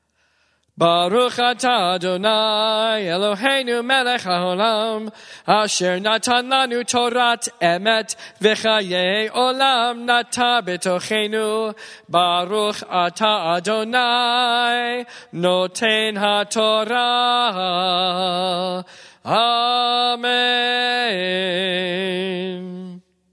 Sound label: Blessing After Torah Reading